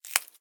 Bonecrack; little robot sound factory

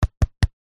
animals squirrel bash nut on tree trunk; zapsplat

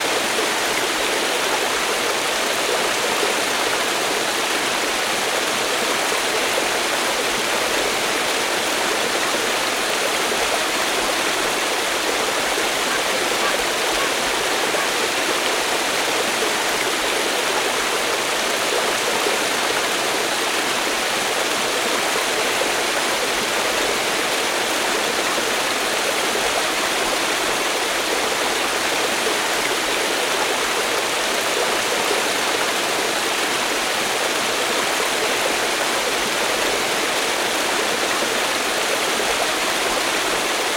water waterfall multi step fast flowing

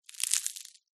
snail squash crush; zapsplat

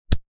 wood small chunk heavy dense catch hands; foley

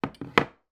food biscuit jar custard creams down on table

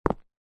foley footstep single football boot on concrete

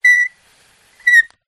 paper blowing air on edge whistle; foley

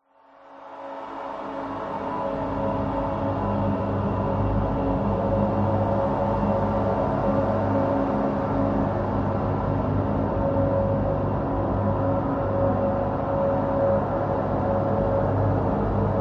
horror atmos drone airy dark evil sinister; zapsplat

